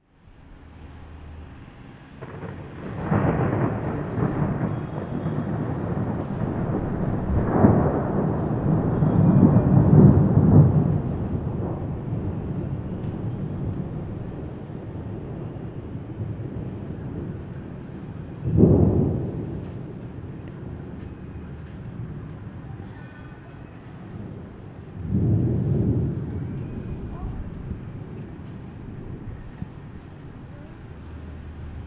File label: Thunder; weathersounds